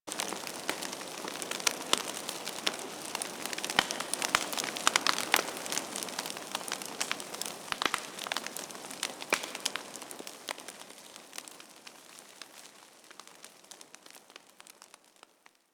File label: AMB Camp Fire; Cinematics; Warframe; The War Within; Mountain Pass